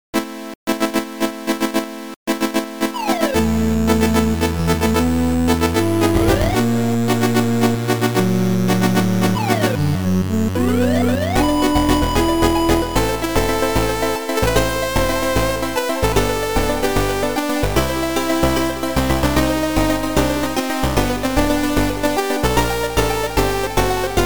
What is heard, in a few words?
chiptune